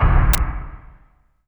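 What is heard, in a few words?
bass drum fx, perc fx; Zero G; Malice In Wonderland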